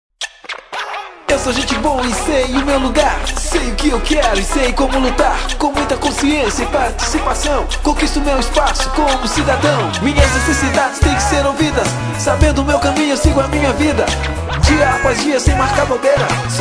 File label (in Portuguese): jingle juventude